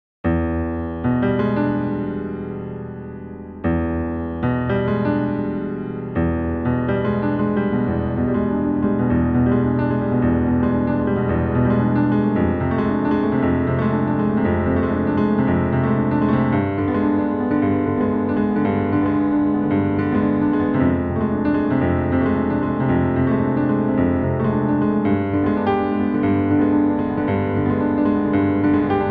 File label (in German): klavier